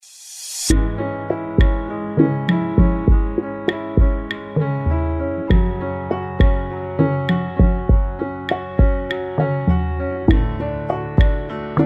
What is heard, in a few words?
Ballroom Rumba